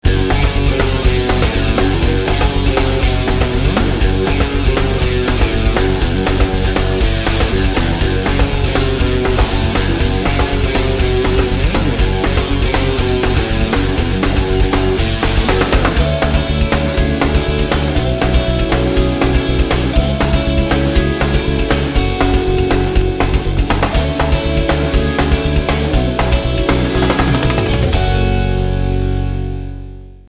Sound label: Power Chord; ragnarok; Bard&Dancer